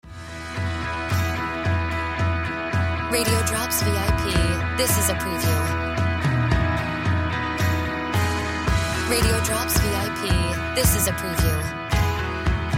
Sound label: Music Bed